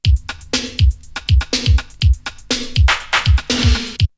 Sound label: Drumloop; ZERO G - Datafile DISC 2